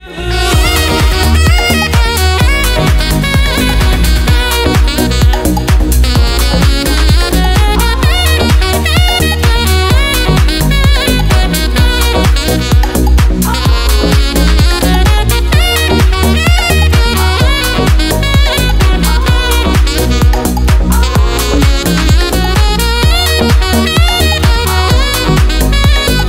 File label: Sax Remix, Завораживающий саксофон